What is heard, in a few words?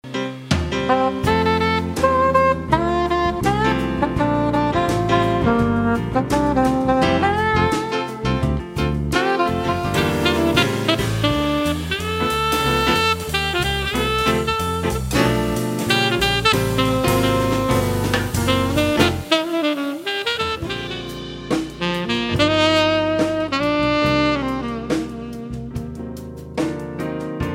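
Sax 4tet short, saxophone quartet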